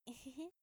笑い声; ループボイス

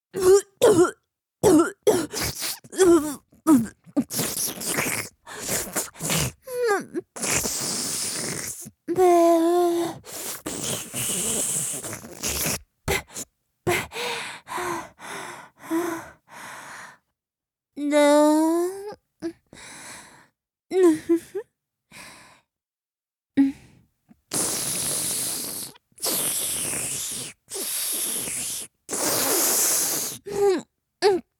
口内射精→掌に吐き出す→すすって飲む, チュパ音; チュパ音抜粋集

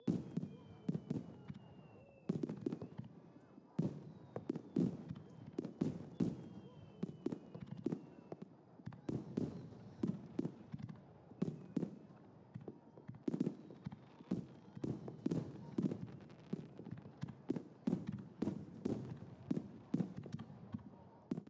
室内 から 聞こえる 花火 の 音

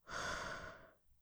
ブレス ロング

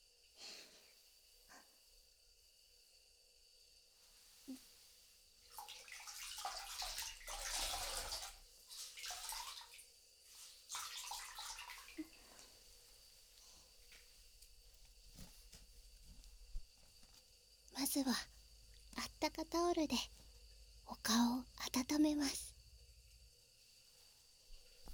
クラシックシェービング; 新装開店バーバーすずな

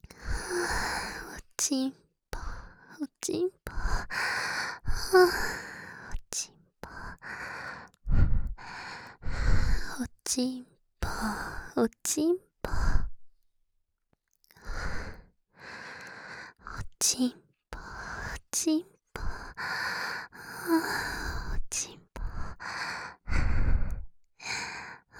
喘ぎ; お屋敷メイドのハーレム淫語ヘヴン 気が済むまで耳舐め淫語を堪能できるバイノーラルオナサポ音声; 右差分

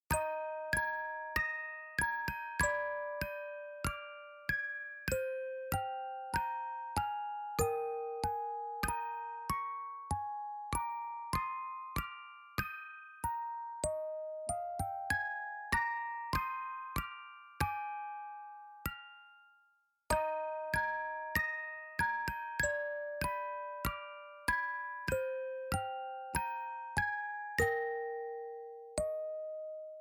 飛空船のオルゴール ループ用＋鳴り終わり

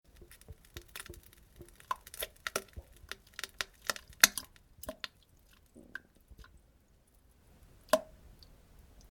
普通うんこ ぴちゃ ぽちゃ音

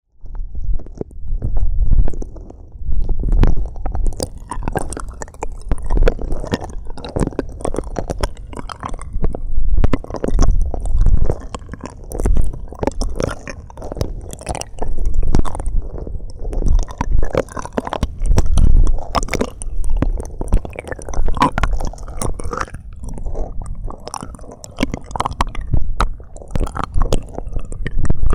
特別コース：両耳奥舐め

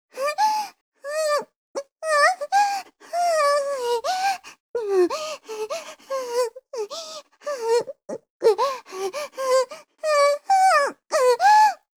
お? おっ おおっ おほぉ…

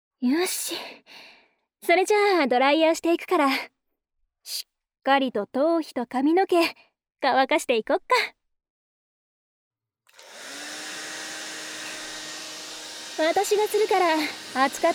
話しかけながらドライヤーで髪の毛乾かし（左右）

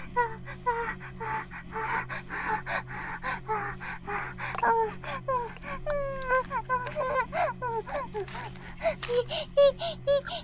バイブ放置, ASMR

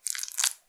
膣内射精前, 引き抜かれた瞬間; ■挿入or引き抜く

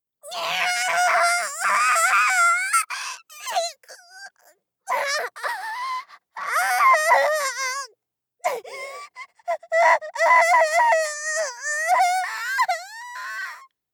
タバコの火を手の甲に当て続ける; 効果音なし

